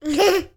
human toddler 18 months boy single laugh

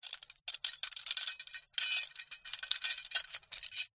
keys padlock fumble movements

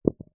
household teaspoon drop carpet

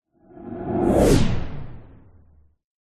magic spell whoosh powerful energy pass electric; fantasy

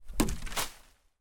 impact large branch hit tree trunk

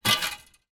old rusty metal fence section movement; foley

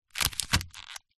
industrial duct tape ball sticky set down wood

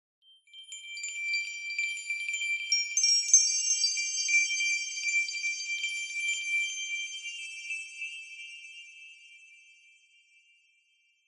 fairy dust twinkle sparkle bell tree med pitched

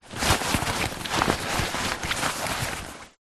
foley bag backpack small full handling; zapsplat

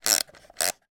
household timer plug wind

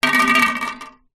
tin small empty no lid down on hard ground; zapsplat

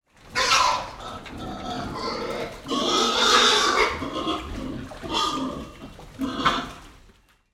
pig grunt squeal inside barn wet sloppy mud

